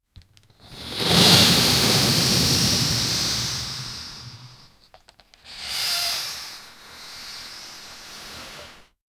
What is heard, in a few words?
balloon rustling; household